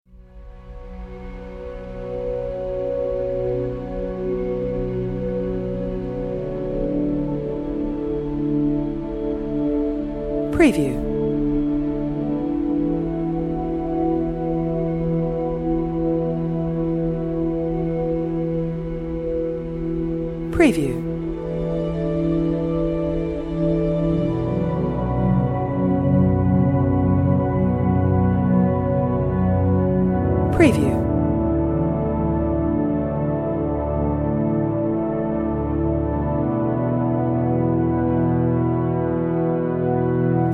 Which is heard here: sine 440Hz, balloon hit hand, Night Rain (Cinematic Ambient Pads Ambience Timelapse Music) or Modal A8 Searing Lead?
Night Rain (Cinematic Ambient Pads Ambience Timelapse Music)